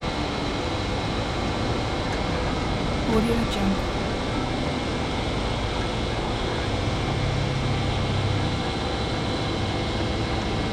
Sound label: power generator room loud industrial drone; prosoundfx